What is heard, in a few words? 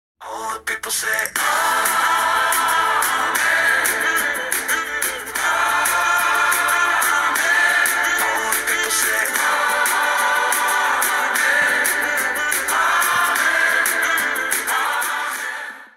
amen ringtone